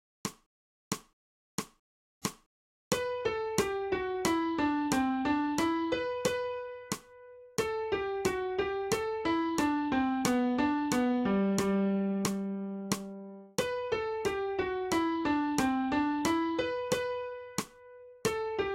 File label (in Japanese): 新フレットレス